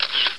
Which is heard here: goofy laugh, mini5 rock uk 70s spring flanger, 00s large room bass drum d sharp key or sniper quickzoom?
sniper quickzoom